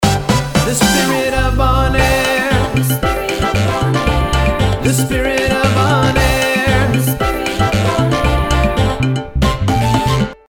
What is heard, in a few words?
Ringtone; Refrein